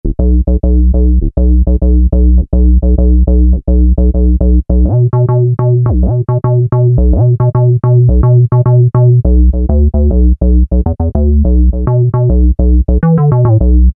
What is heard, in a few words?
Bleep Bass; Generalmusic Equinox